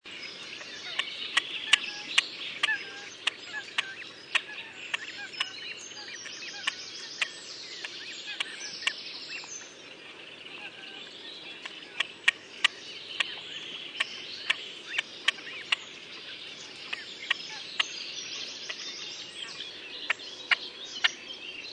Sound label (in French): Grebe huppe